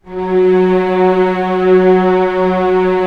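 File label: STRINGS F#4 FF, Orchestra; Sound On Sound - Peter Siedleczek's Orchestra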